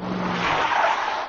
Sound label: braking car; BALLY